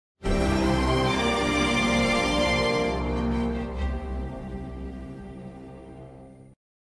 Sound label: suspense